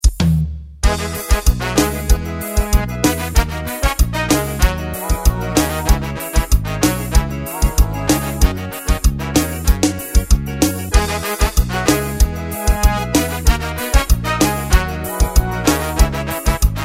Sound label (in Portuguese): forró